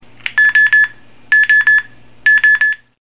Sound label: beep beep beep